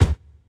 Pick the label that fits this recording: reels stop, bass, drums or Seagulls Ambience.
reels stop